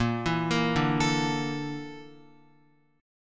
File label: Bb Major 7th Flat 5th Bb down Guitar; BbM7b5 arpeggiated; JGuitar